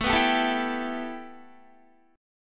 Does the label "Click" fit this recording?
No